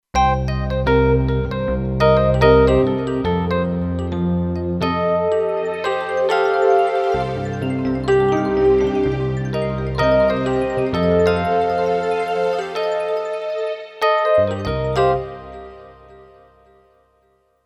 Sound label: EPno Pad, Kurzweil K2500X; Factory Setups